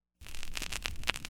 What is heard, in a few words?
bum bum song